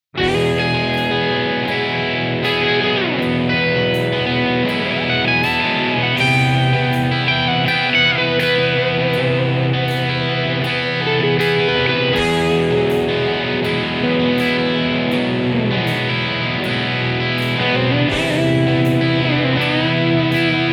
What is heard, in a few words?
MAXON OD9PRO+ Bypass; No.4 Fat Drive; OD9Pro+：Boost mode; Sugi Guitars DS496; Amp：Drive; PU：Hum